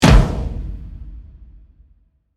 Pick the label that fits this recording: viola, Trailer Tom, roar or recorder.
Trailer Tom